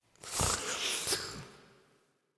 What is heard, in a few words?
Idle Lick Snort; Ambience; Warframe; Entrati; Tagfer